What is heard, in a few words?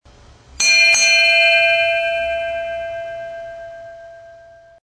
bell ting ting